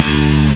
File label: Synths C; Vengeance Samples